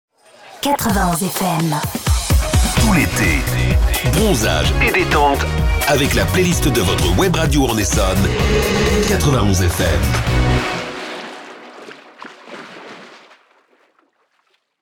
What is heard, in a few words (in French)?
Jingle Eté